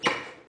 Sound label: Button release